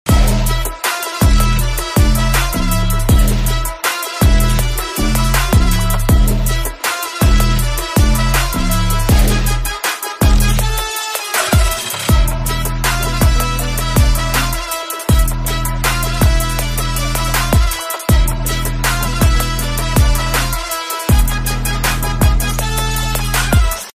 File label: tamil bgm ringtone; garudan; soori; sasikumar